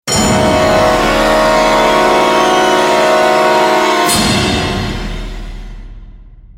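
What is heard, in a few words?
Sting Large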